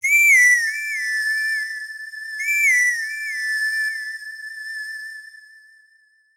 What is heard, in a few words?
Horse Call; Whistle with the Horse Call (full and half versions)